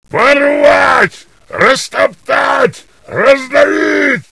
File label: zombie madness